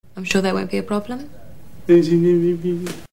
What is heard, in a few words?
Haha